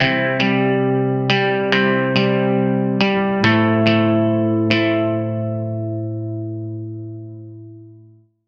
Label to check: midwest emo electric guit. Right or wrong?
Right